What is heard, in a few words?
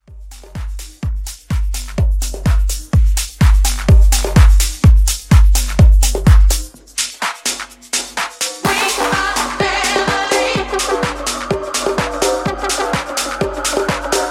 Club House Mixshow Edit 126